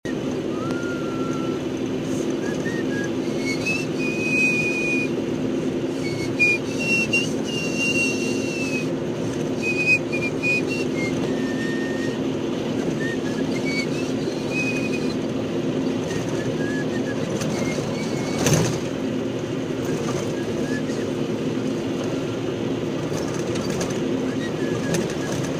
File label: Mongolian whistling in storm